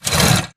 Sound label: Stalker Damaged Crawlscrape Concrete; Titanfall 2